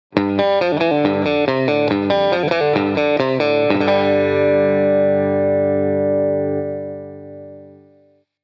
Middle Pickup Country, guitar

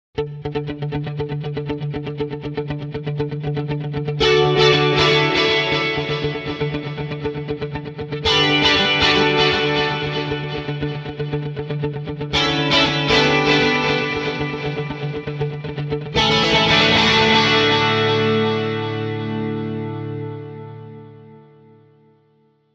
Middle, guitars; RUN

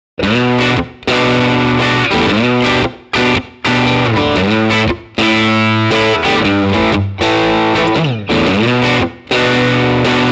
Bridge 7.5k Drive, guitar

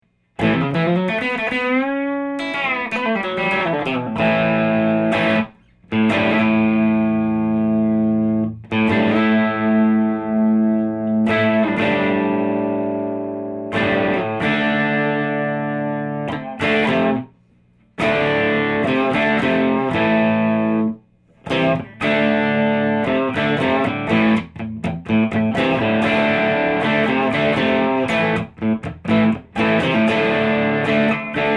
pickup 3 country; D Leddin

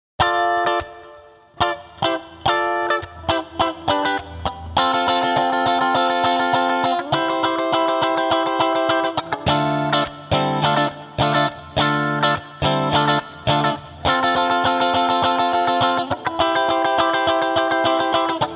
Bridge Middle, guitar; BRICK

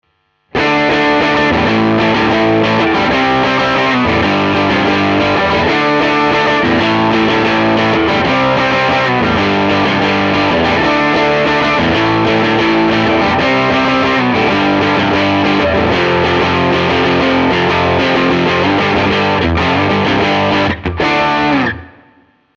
P90 Garage Rock, guitar

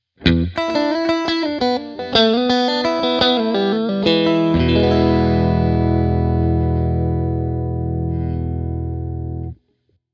Neck and Middle Pickup Clean, guitars

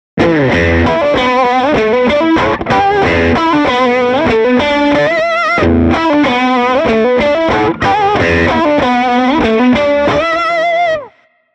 Bridge and Middle Low Down Blues, guitars

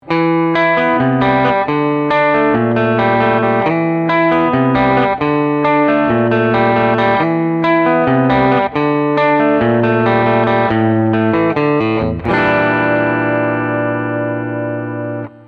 P90 Clean, guitar

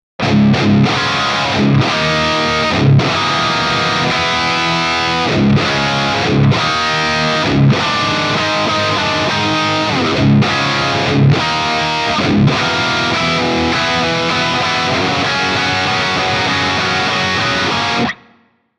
Really Dirty

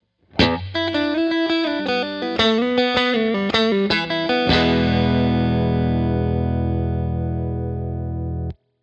Neck Pickup Clean, guitar

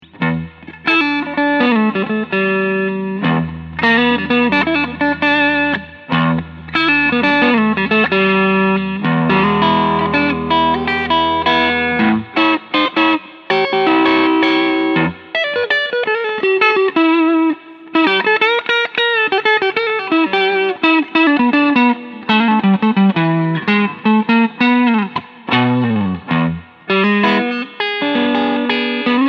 Tele standard neck fat blues, guitars

